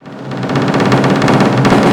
Tom Fade In, Drum & Bass, Drums & Percussion, Percussion Fills; Sonic Foundry (Sony Creative Software); Futurist Drum & Bass